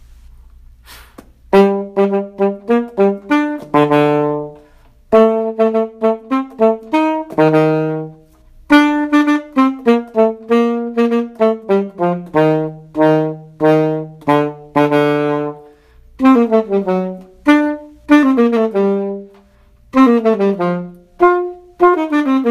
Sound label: tuba